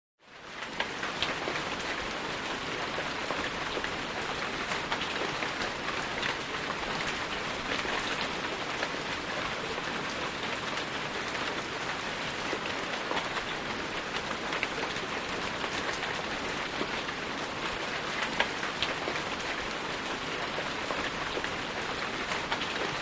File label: Rain; Heavy, With Fast, Splatty